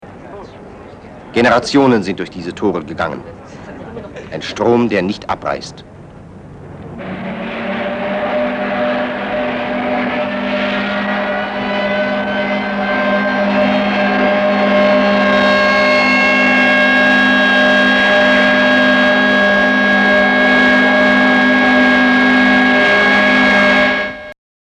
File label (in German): FEIERABENDSIRENE; BASF